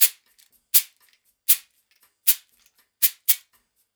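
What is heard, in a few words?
shaker, Percussion Loops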